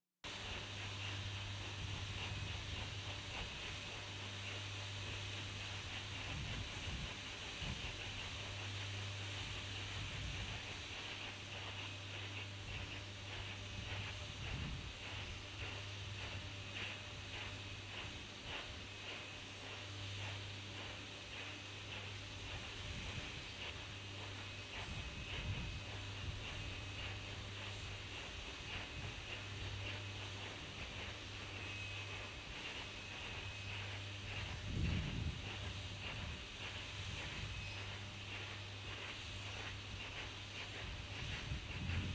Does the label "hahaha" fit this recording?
No